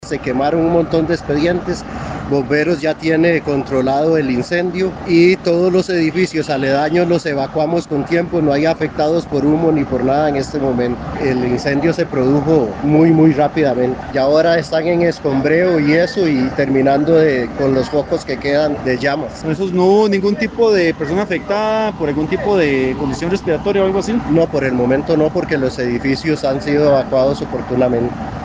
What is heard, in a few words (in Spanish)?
Incendio; Jesus Brenes